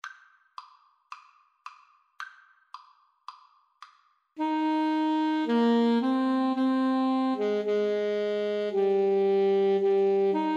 brass, clarinet saxophone duet

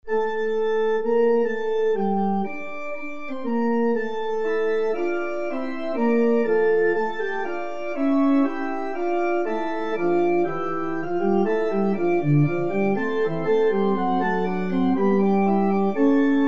ach gott vom himmel sieh darein, organ

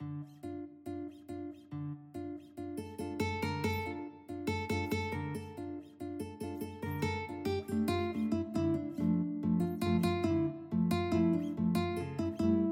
barber, guitar

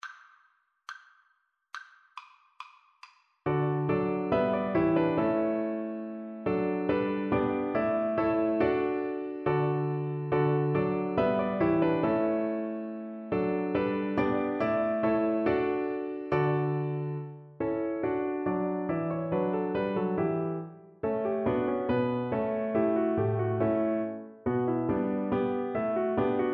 ding dong, Double Bass

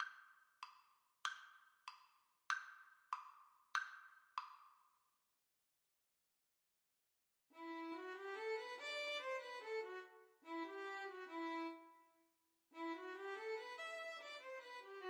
Violin Duet

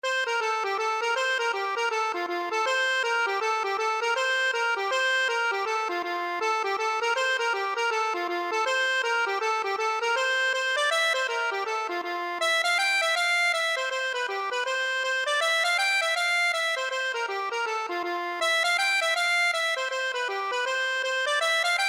accordion; kate kelly

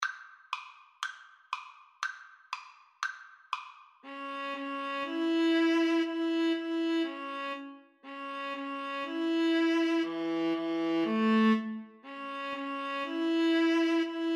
Violin Viola Duet

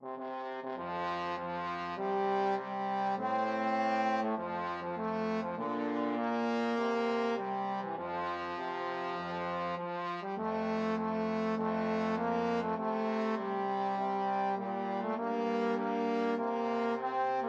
trombone duet

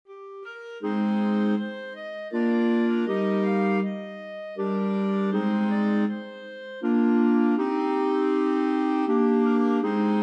5 clarinet, clarinet choir; holst

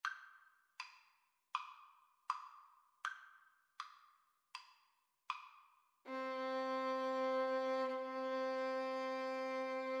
violin cello, Violin-Cello Duet; schubert ave maria

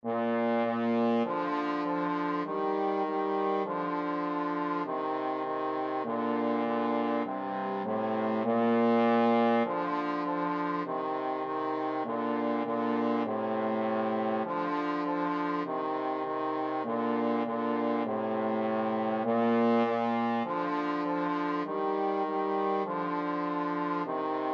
twinkle twinkle trombone duet

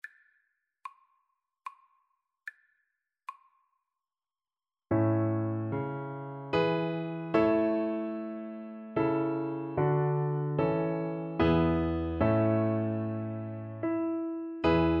finnish national, Trombone

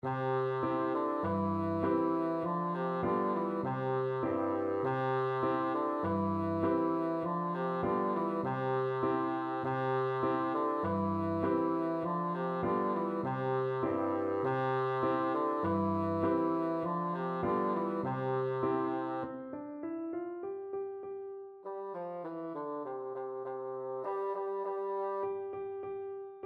alouette, bassoon

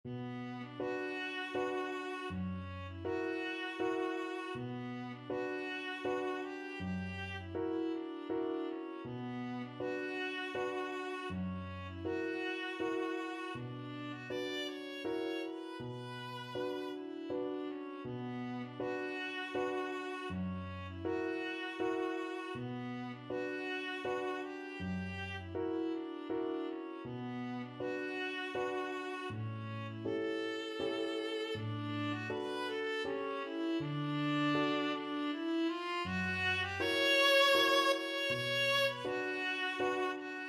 waltz op 18 no 6, viola; schubert

